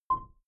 button keypad tonal dirty; signale

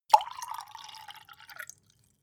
glass pour soft; soundpool

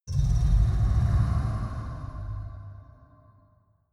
metal low rattle fast

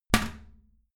toilet lid close heavy, badezimmer; haus-garten

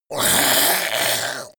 zombie grunt choke male

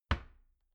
door wood close soft

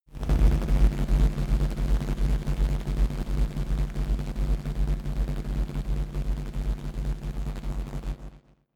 dark rumble noise, sciene fiction

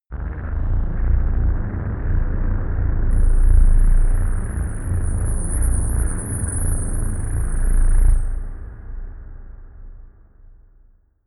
dark rumble cricket, drones; musikelemente

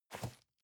cardboard box medium place slide soft; objekte

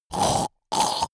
monster choking zombie short; comedy-cartoon; soundpool

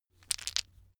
stone gravel clatter short; natur-tiere; steine

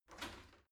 shower slide door slide short open

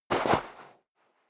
step sneaker sand jump; schritte

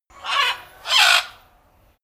bird screecher; natur tiere; voegel

